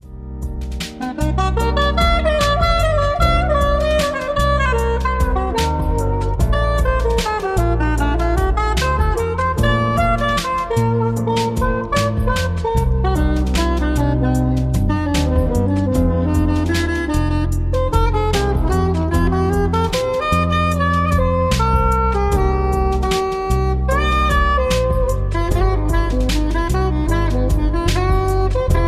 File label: Jazz Melodies